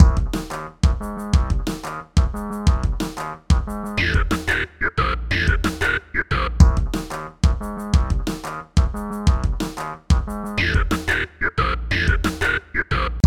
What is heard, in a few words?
Retro Crime Movie Loop